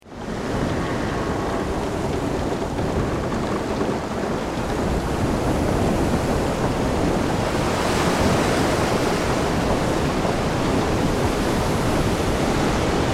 Pirate Ship Ocean, Ambience, Pirate, Ship, Ocean, Waves, Wind, Wood, Creaks; 5.1; BlastWave